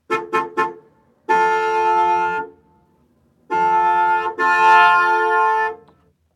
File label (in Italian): Clacson, Olofonia